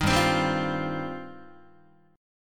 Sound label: G 7th Suspended 4th Sharp 5th, Guitar; G7sus4#5 strummed